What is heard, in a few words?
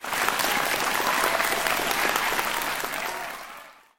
win big; Skillzgaming; mega Money Rush Desktop